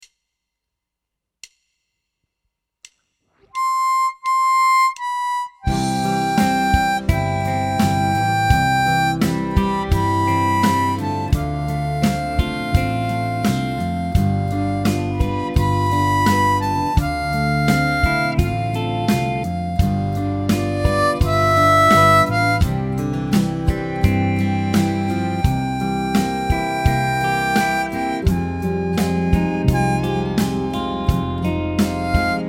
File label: 85 harp